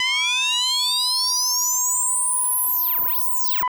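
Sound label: Intermodulation Distortion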